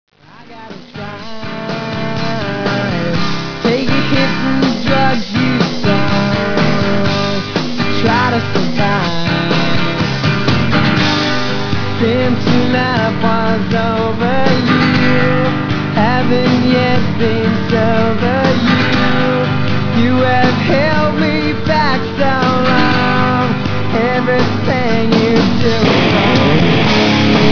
pop song

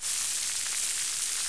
rain

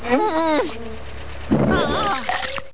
noise; "URRRGGG... AAaahh... (plop)."; rocko

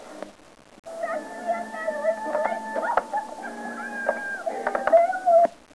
church evp

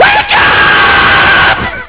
wake up

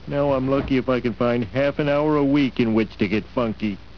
funky

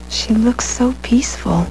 peaceful

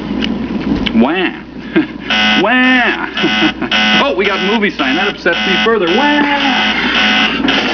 wah

